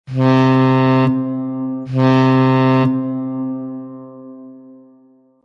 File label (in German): Schallsignale Binnen Kursaenderung Backbord